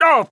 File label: Wizard Pain